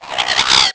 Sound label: roar